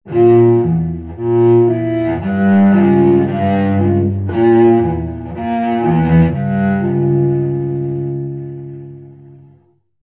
Cello Danger Eerie

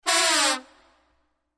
Trombone Joke Fall

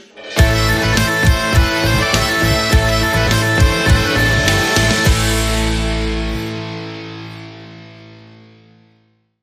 Metal Sting

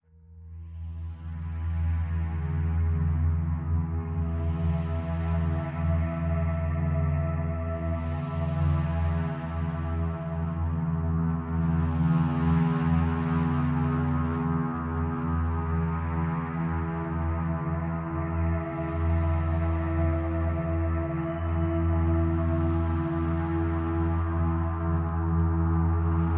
Ambient

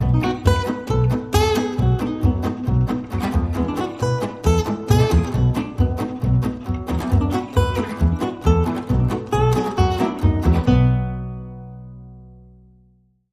Fast Blues Swing

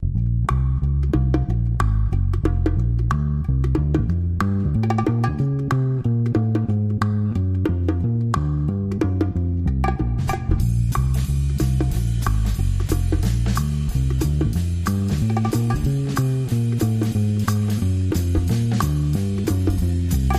Rhythm Underscore

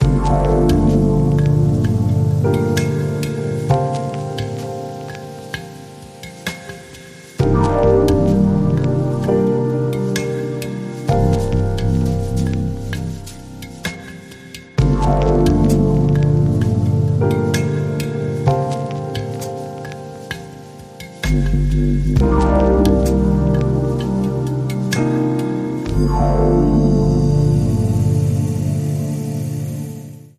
Deep Chill Mantra Inst

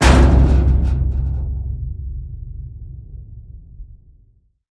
Hit; Org Rattle The Cages

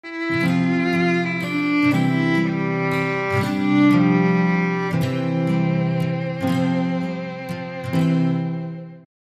Sting

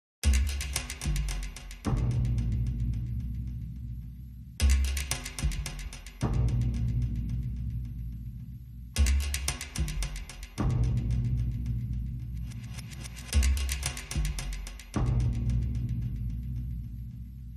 Ticking

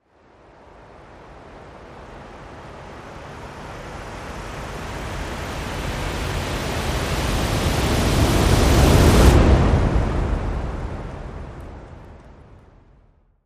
Winter Storm Rise

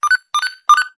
Three Quickie Beeps

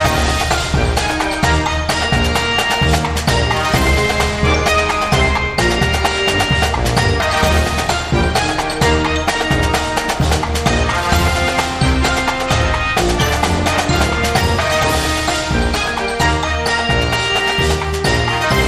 Drumline March

